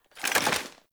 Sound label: Rocket Launcher Reload; Warframe; Weapons; Tenno; Lasrian